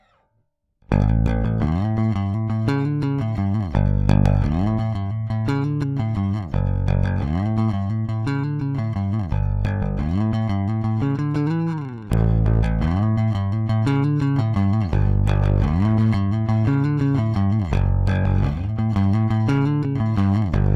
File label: Dry Mix Pick